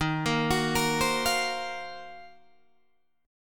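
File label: E flat Minor 6th Add 9th, Guitar, Open D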